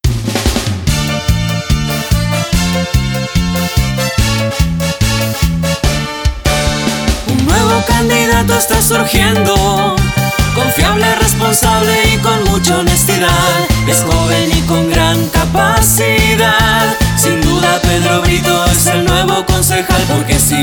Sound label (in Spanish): Jingle Politico cuarteto melodico; pedro brito